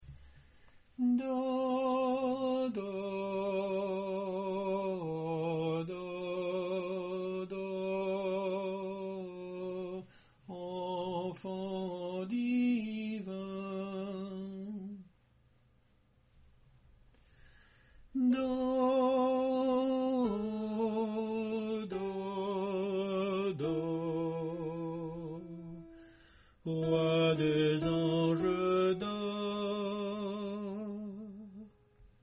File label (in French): tenor; entre boeuf et ane gris